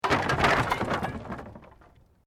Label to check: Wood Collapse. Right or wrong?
Right